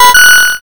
correct

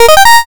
start

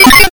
glitch

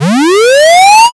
charge

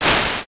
swoosh

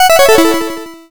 gameover

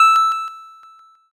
ding

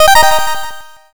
win

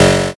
zap

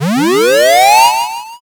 charge

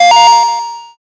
coin

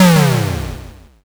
explosion m

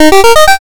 powerup

